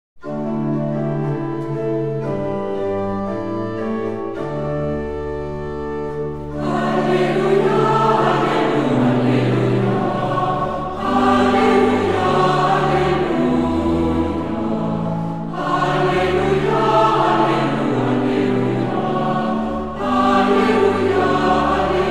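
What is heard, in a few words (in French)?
ensemble vocal alléluia dit de Taizé; ensemble vocal l'alliance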